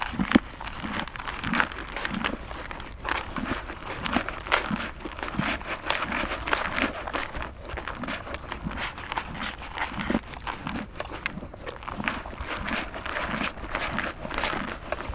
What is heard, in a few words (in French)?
Cheval mange avoine seau